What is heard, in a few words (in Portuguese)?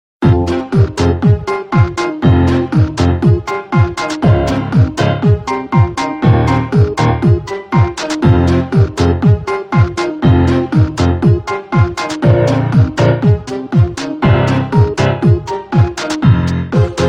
musica